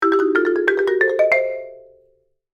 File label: marimba ringtone